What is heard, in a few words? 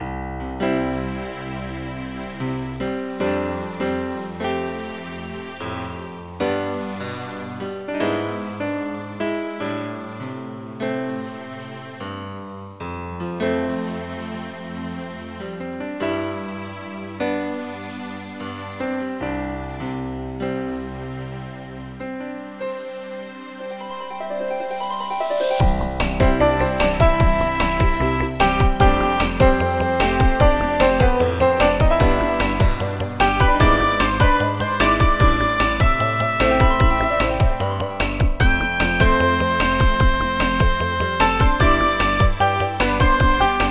little pianist